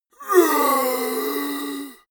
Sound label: monster growl hoarse; comedy-cartoon; soundpool